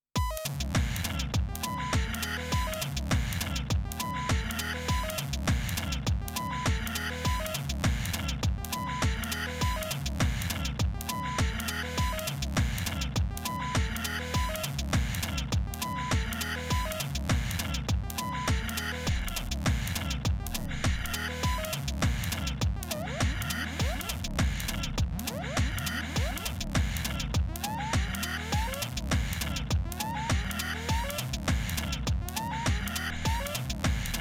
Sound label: Korg Volca Sample2